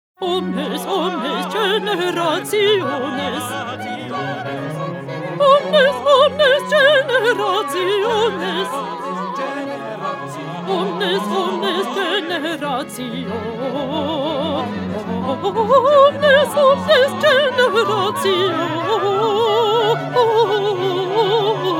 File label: Alto; Bach; Magnificat